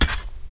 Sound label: foot; EmuClient